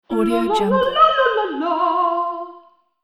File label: singing female vocal warm up